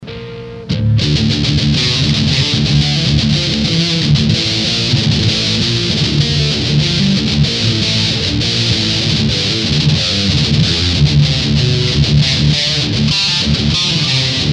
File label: shred